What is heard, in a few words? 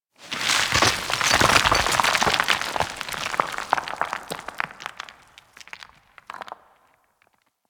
Coring Rock Pour D; Warframe; Ambience; Grineer; Grn Intermediate Coring